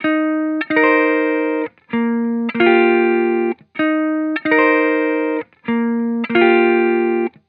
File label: Vibrations Chill Guitar Loop 128 BPM D# Maj; Cymatics; Vibrations Guitar Sample Pack